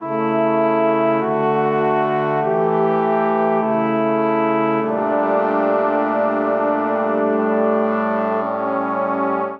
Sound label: Phrase Dank Cadence A Major 100 bpm, Brass Quartet; MIDI Clips; Ableton; Spitfire Audio